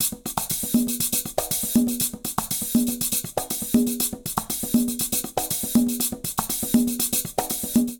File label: Merengue 120bpm, Latin Percussion; Ableton; Factory Packs